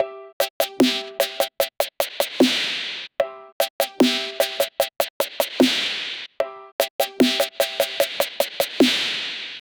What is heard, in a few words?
urban pulse drop trap; 8s